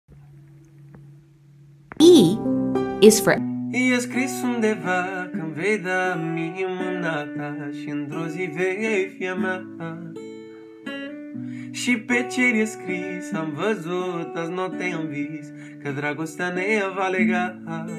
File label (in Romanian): Manele